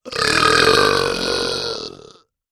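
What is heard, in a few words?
Large Nasty Belch, belching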